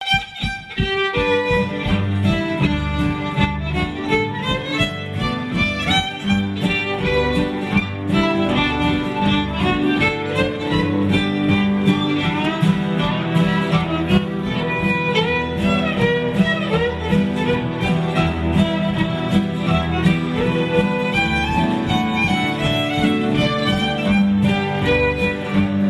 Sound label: canterbury waltz